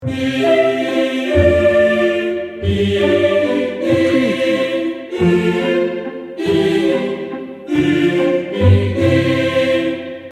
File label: morning song loop